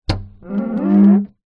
household mirror rub fingers squeak

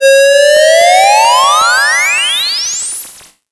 cartoon ascend tone high pitched sci-fi; zapsplat

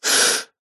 human male 1x short inhale breath

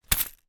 foley money in envelope drop

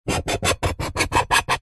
knife tip scrape metal surface sharp; zapsplat

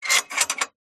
household gate bolt metal movement; zapsplat

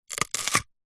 human eat bite potato raw single

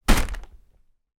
foley paper lampshade sphere type hit with hand

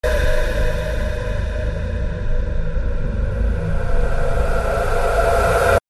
Articulations Reverses; Babushka

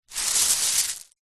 small stones scoop up by hand; foley; zapsplat

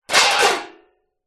household metal baking tray down on concrete; zapsplat

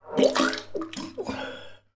human man vomiting in small bathroom

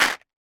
industrial small screws put in empty plastic box

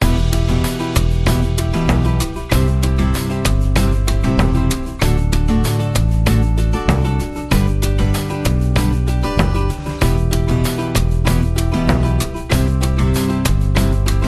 music Happy; orlamusic

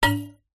impact fluorescent light bulb no smash; zapsplat

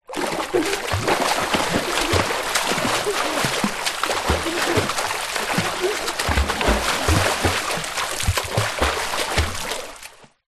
sport child swim in pool short

